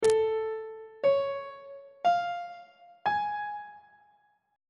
a augmented major 7th chord intervals on treble clef